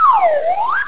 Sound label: Ziou Houp; MOBICLIC; langues